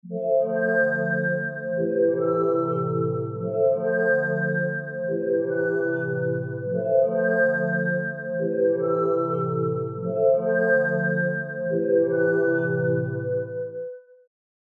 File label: Pad; Secrets; trap vst - Io